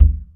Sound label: TR kit, techno kit; Pro-Rec - Synthsonic